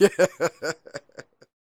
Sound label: LAUGH; Spectrasonics Vocal Planet; Jazz FX